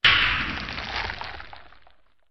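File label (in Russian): звуки магии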